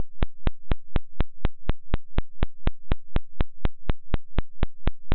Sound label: clicky glitch